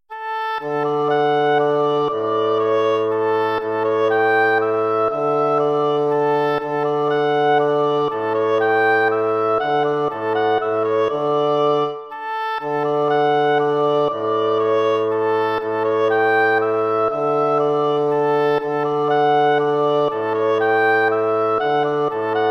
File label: waltz; Carulli Ferdinando